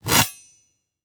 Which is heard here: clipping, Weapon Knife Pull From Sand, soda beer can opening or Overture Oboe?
Weapon Knife Pull From Sand